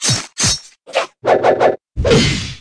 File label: Train; Conquer Online 2.0